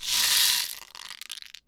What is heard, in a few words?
box rattle; Kenya Box Rattle